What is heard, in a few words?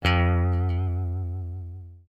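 Guitar, Jazz for piano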